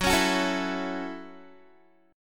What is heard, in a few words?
Gb Minor 7th, Guitar; Gbm7; strummed; 14,16,16,14,16,x